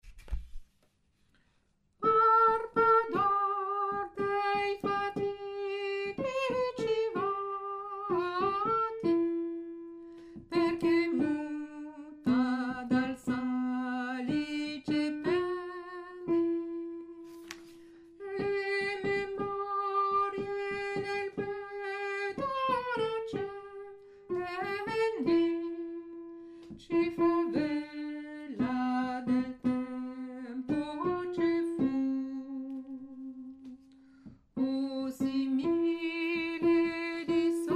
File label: va pensiero alto